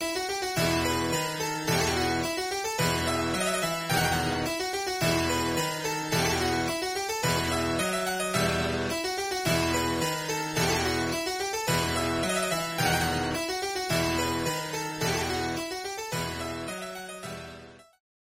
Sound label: Tambourin, Harpsichord; RetroMagix; Syntheway